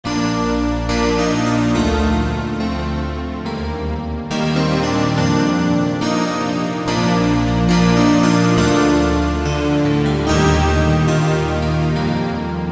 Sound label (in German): DX Ballade Hymne